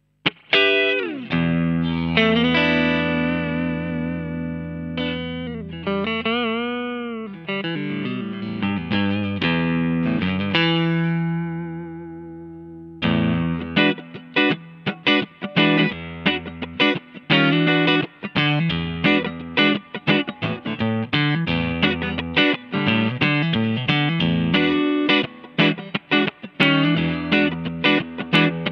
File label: Clean Channel DI